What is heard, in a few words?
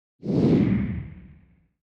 Spaceship Passing